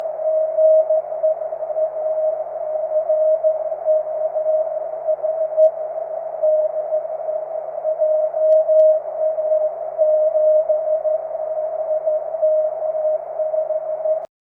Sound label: cw